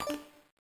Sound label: P C Enter Reset; MiSide